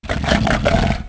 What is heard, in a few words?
moan